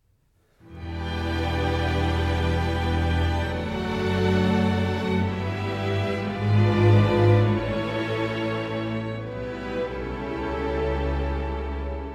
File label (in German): fuer Streichorchester d-moll adagio; Souvenir de Florence; Adagio Cantabile e con Moto